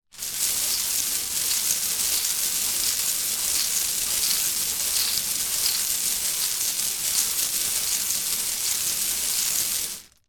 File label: ball roll, bingo; general bingo